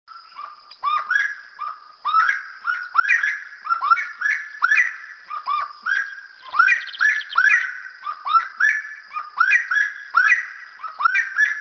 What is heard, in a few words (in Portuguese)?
Saracura